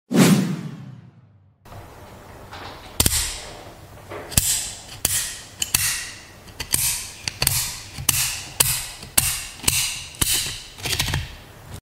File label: Cutter